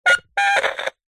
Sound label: cb radio walkie talkie crackle beep button; technology; zapsplat